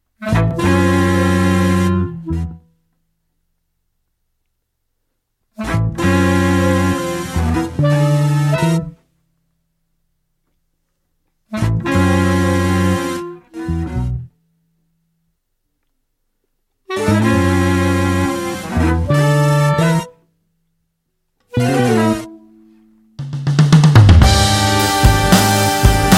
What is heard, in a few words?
polytonal organum; Explorations in Polytonality and Other Musical Wonders